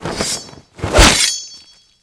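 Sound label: bell Attack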